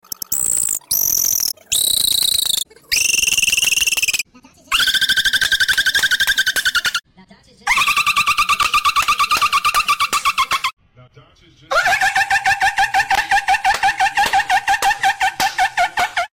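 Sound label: goofy ahh laugh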